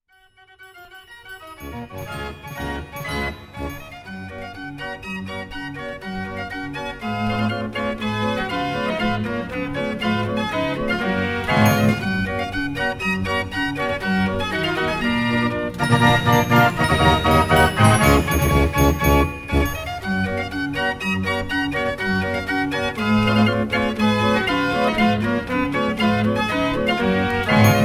bruderorgel; waldkirch

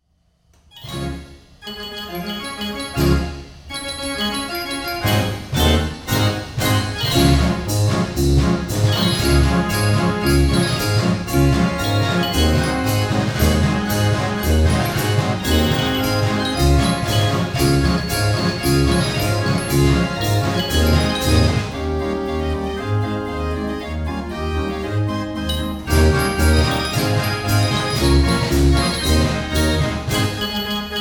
einzug, concertorgel; 59 er gebr wellerhaus; de wellerhaus

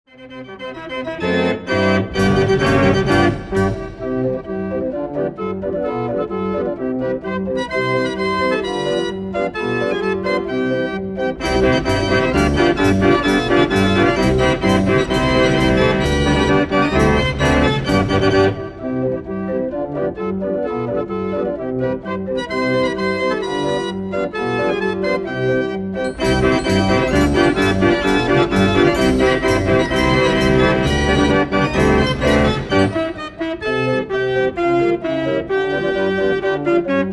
concertorgel; 36er ruth sohn konzertorgel

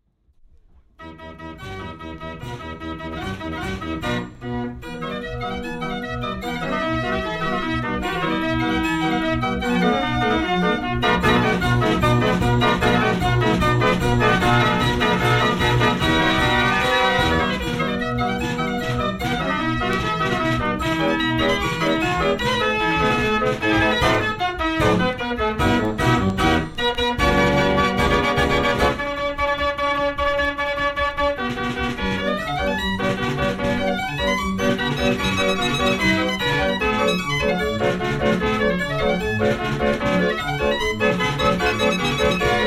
dreh und karussellorgel; hannoversches

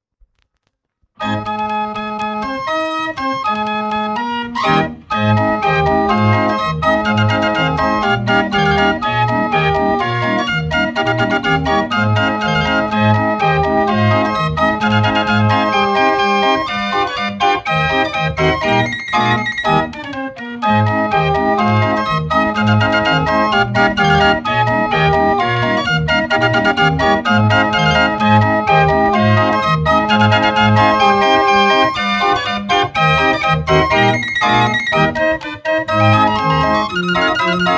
potpourri, Berliner Leierkasten Musik

